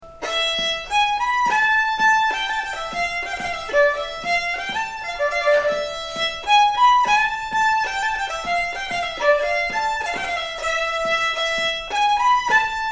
polska

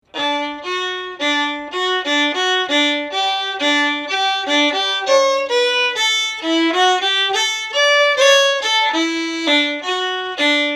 finnskogspols andra stamma

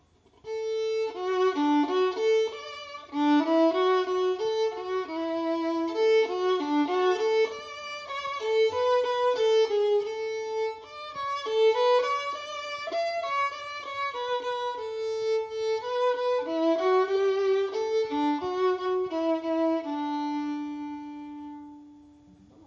Dansk

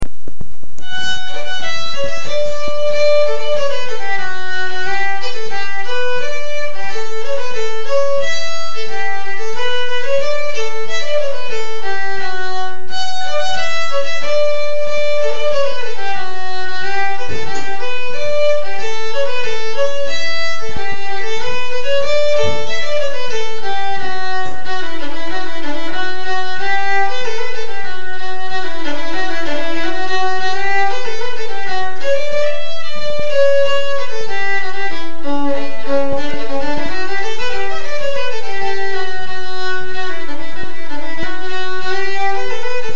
Festpolskan 2a stämma; Lustspel

